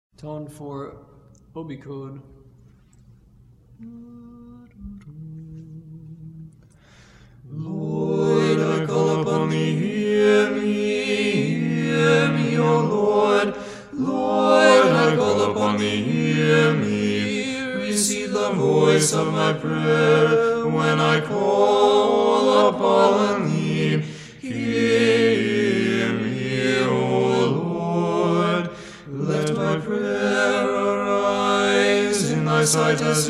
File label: Tone 4; Lord I Call